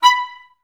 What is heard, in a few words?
STAC B4, Orchestra; Best Service; Peter Siedlaczek's Advanced Orchestra